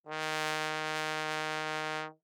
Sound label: Bass Trombone ff Eb3; MIS Pitches - 2014; Brass